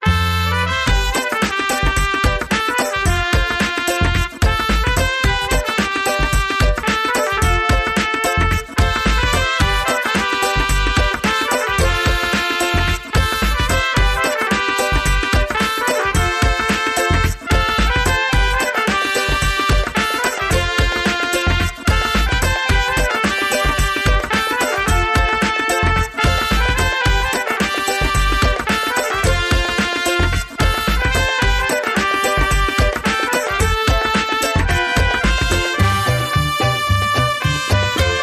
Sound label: Mariachi Funk